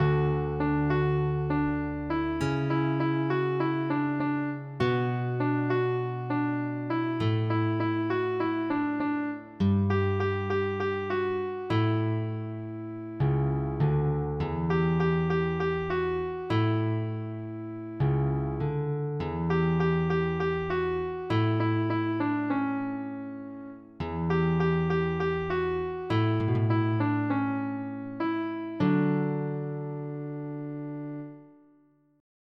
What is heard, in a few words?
piano; PSE Music; normal tempo; PlayScore